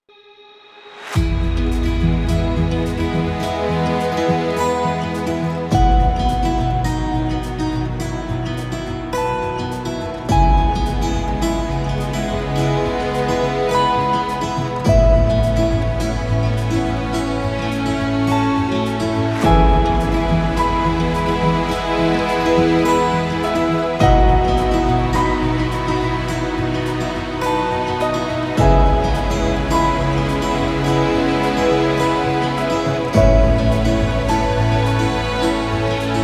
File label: Inspirational Emotional Cinematic Wedding; Beechwood Hotel